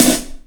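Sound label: metal snare drum, FM drums; East West; Global Sounds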